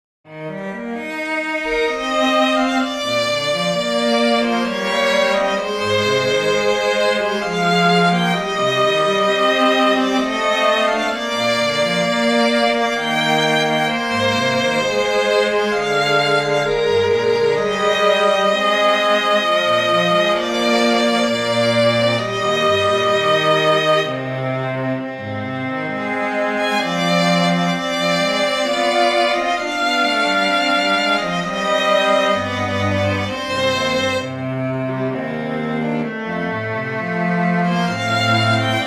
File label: sinfonia eb major for string trio; bach johann sebastian